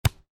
Volleyball hit soft, Volleyball (Exterior): Hit Soft; Sports; Miscellaneous Sports